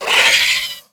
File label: scared, headcrab black; creatures; vox